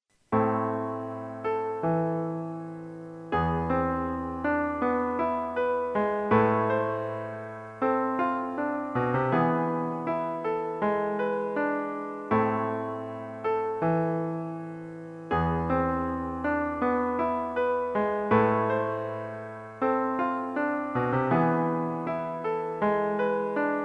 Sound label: trio for flute clarinet piano; leonard anderson; bird; saboohi ehsan